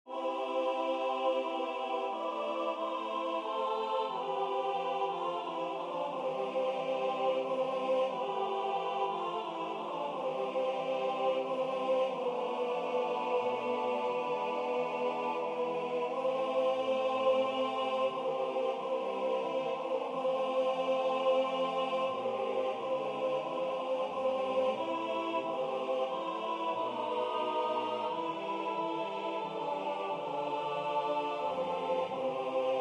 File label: Alto